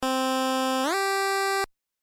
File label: portament